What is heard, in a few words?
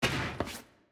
Chomper Kick; Puzzle